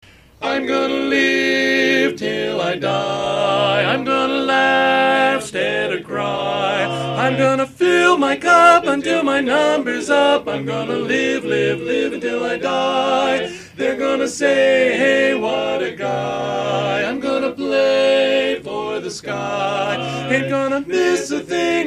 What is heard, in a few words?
Gonna Live lead